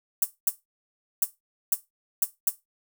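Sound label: Hat Pedal; VariBreaks; hydrogen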